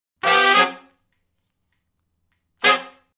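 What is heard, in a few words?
R&B Riff (Ab) harmony; Sections; Crimson Blue and Fabulous Horncraft 4 RnB; Horncraft for R&B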